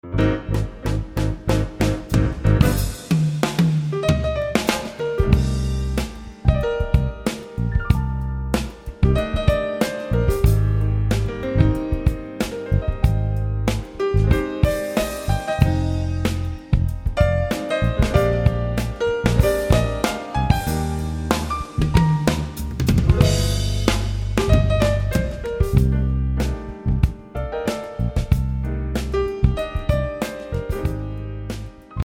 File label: Piano bar